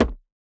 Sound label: Bounce Generic